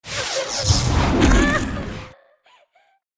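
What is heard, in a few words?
ghost woman attack, monster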